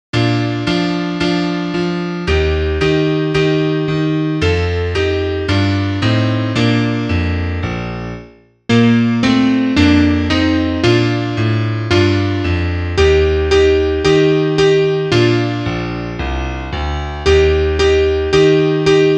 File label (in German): Klavier; Alle meine Entchen